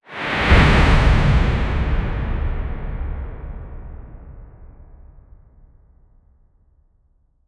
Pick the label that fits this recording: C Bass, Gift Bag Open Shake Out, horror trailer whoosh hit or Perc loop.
horror trailer whoosh hit